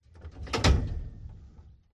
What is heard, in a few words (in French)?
PORTIERE CAMION FORD 1924